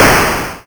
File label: Explosion